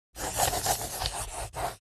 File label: Pencil scribbling on paper